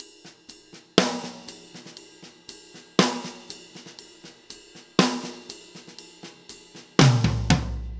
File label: New York Loop, Drum Tools, Laidback